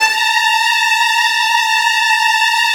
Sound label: A3, Strings, STR HLF TRLL; ILIO; Kirk Hunter Virtuoso Strings VOL-3; 90 s Sample CDs